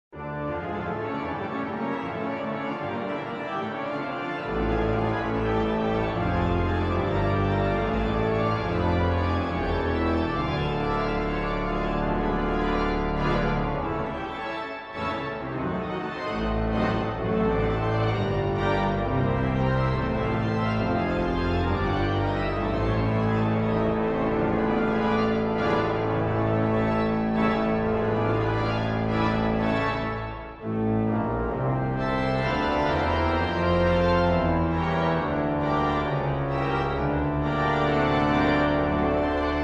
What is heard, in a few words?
organ, passiontide